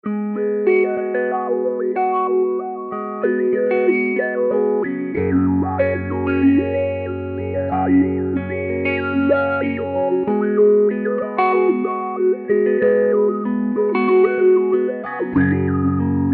formant; mobius

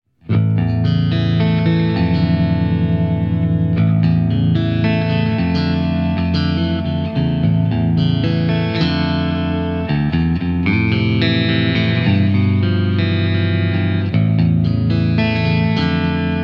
Subtle Lushness; Strymon Riverside → Strymon Iridium → Strymon DIG

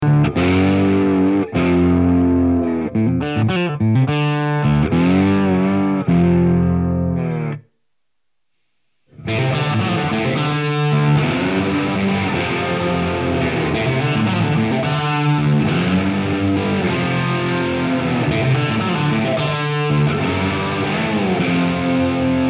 Exploding Slides; Strymon Deco → Strymon Iridium; Tape

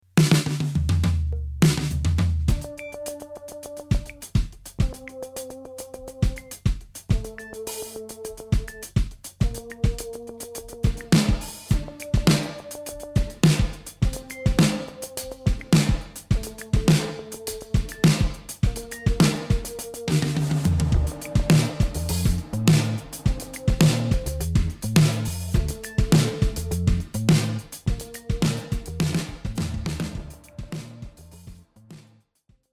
Wet Drums; Spring machine set to Combo with 3 springs, and another Spring machine set to Combo and 2 springs.

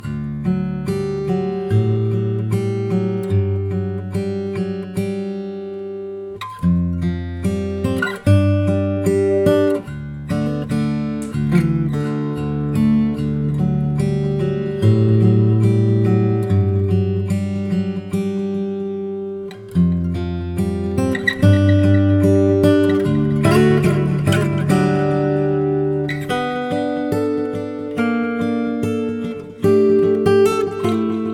Acoustic Echoes; 24/96, Series, eighth notes, low mod, Delay 2 in golden ratio

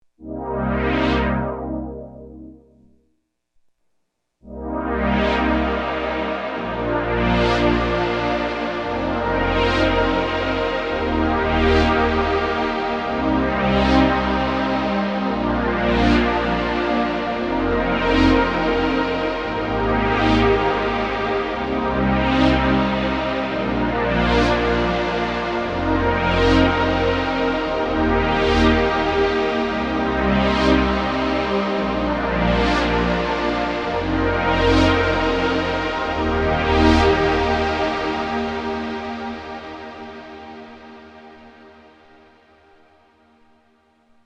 cloud roland juno 6; bigsky

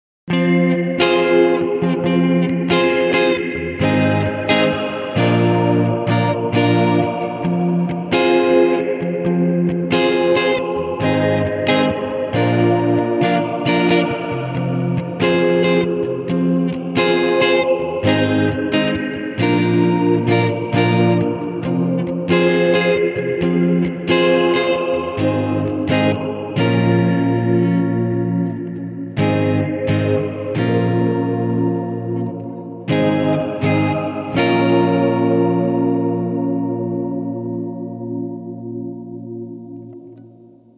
chorale; bigsky; reverb; Faint Voices

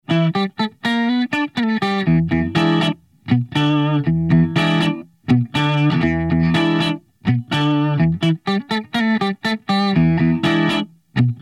Wide Cassettes; Strymon Compadre → Strymon Iridium → Strymon Deco; Tape

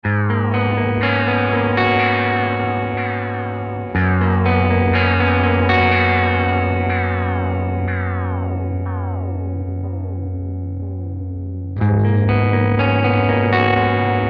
quadrature, mobius

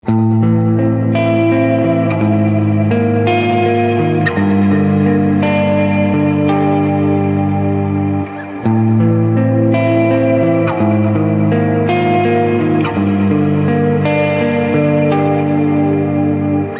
magneto; bigsky

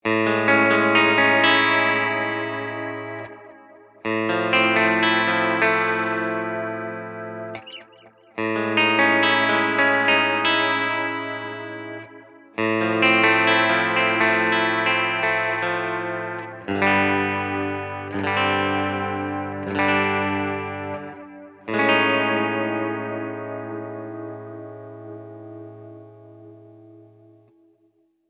Dreamy Slow Modulation

